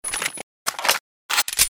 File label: vector reload, weapons